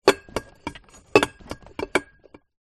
household glass vase movement in storage box

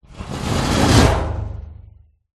metal scrape resonate garage door; foley

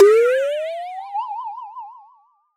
sound design pop ascending tone; dustyroom

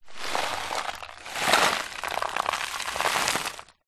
dirt debris small in plastic bottle tip movement

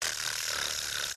fantasy fairy fly up to microphone

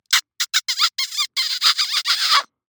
KISS a long comical smacking smooch

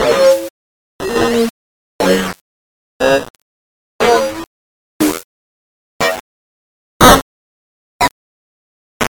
Static Radio Glitches; bottlerocket

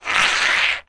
flashlight metal spin ground; household

